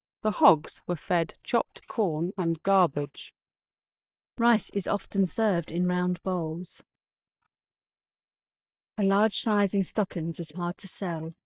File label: Screaming kid; Krisp